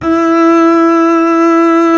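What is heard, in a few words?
string contrabass E; Miroslav Vitous; Solo Instruments VOL-2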